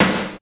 SNAREDRUM, D110 DRUMS; Crypton - DrumBase5000

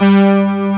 BRS PF G1, brass; AKAI S6000 CD-ROM - Volume 1; SAMPLE WAVE MIXING 1

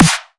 drum snare standart; Virtual Riot; Drum Hits

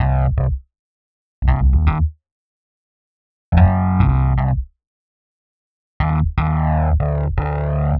Rhodes Bass C, 120; PowerFX - Nu Jazz House

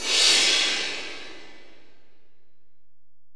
Ride, Alesis DM-PRO, Drum Machines

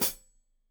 Hat Foot, Hi-Hat, Cymbals Stereo

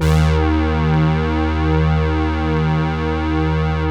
Deep Bass d#2, Korg EX-800

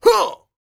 A YELL, MALE HUMAN; ILIO; Vocal Planet

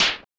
slap efx, Percussion; Giga Samples Collection; EMUSP12

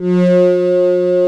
CHOIR MIX PAD

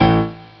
m1 piano 16', Amiga Soundtracker Sample Packs; ST-XX Amiga Soundtracker Sample Packs

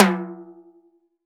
Timbale Lo, Perc; Akai MPC500; LA Perc

